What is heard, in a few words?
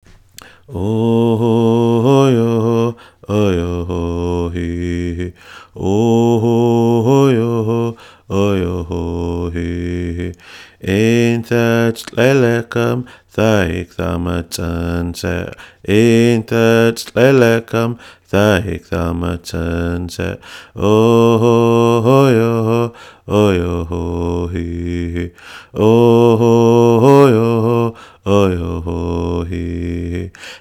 song